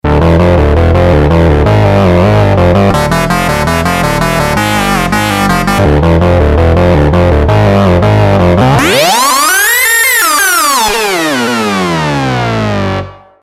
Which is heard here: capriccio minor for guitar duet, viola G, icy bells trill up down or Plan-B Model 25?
Plan-B Model 25